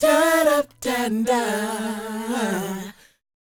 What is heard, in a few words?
DOWOP E; ILIO; Vocal Planet